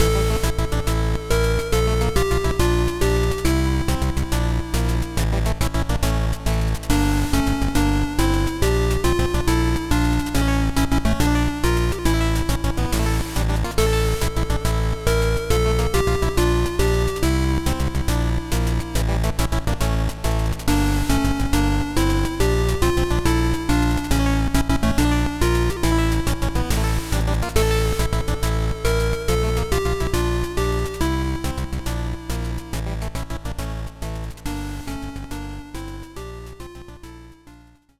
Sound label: Battle Results; Shantae Advance Risky Revolution; GBA